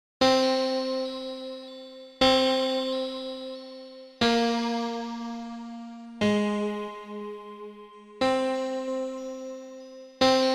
chords are weird; weeklybeats 2024

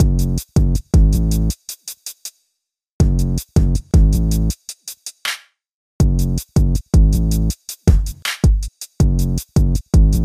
boop boop boop boop

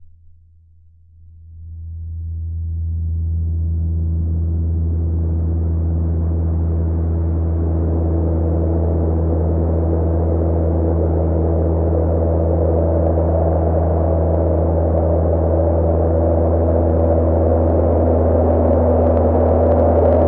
noise etude; weeklybeats 2024

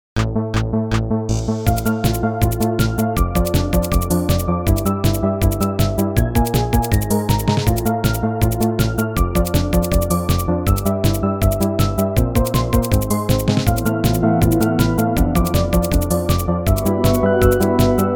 crash wave; teklab; weeklybeats 2026

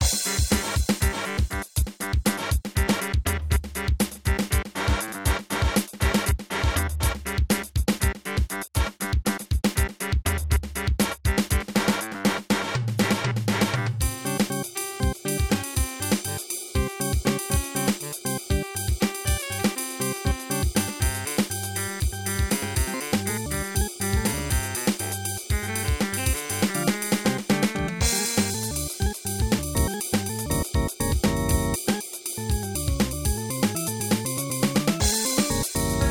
midi sketch; weeklybeats 2024

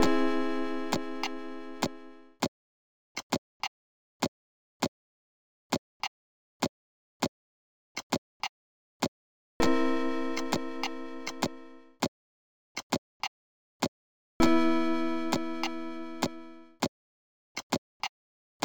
make a drumkit from a single sample

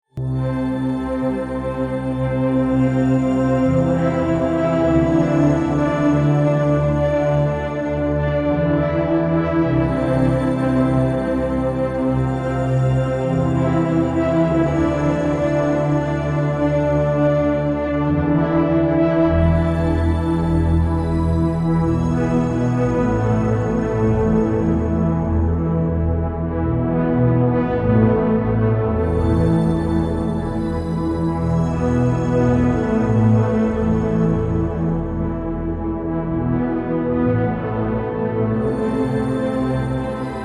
pensive strings

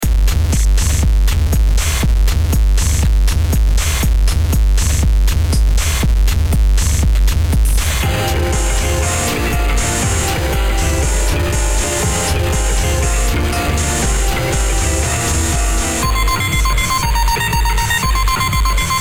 bass

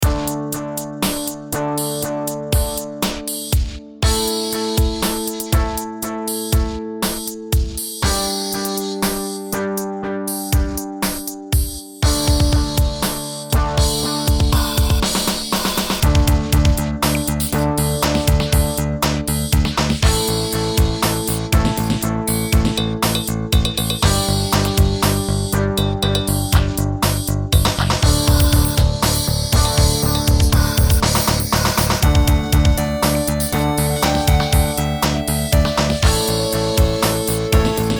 improv with silly drums; weeklybeats 2012

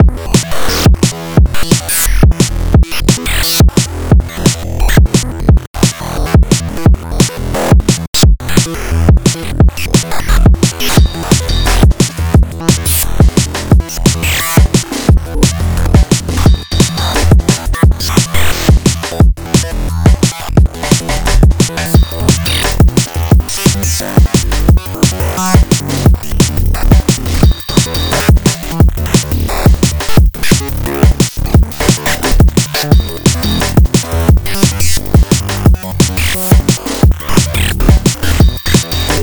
wavetable funk; weeklybeats 2026

